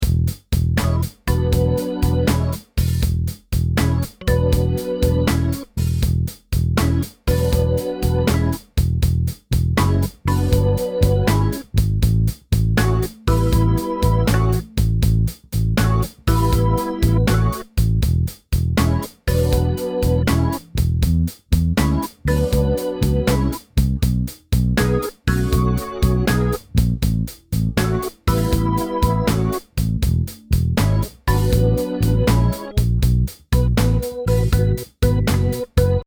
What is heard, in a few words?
12 Bar Blues Shuffle E, loops